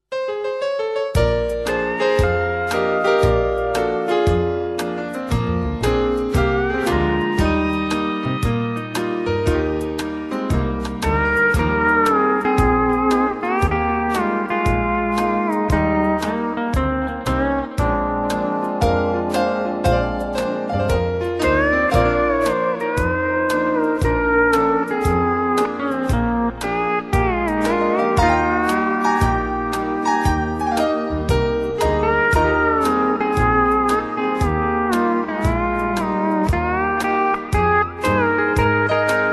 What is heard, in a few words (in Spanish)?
Guitarra Hawaiana Música Hawaiana